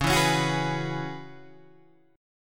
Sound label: C sharp Minor 11th C sharp, Guitar Open G